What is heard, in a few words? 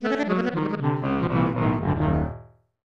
Lose Life; Pink Goes to Hollywood; SNES